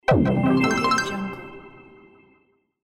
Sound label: arcade win